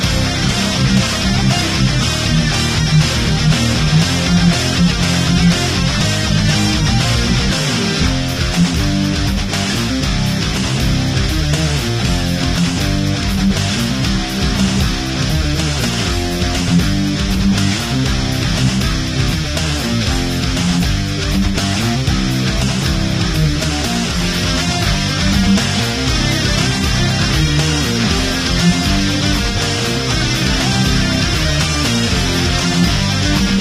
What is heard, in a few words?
boss music; svencoop; keenhalloween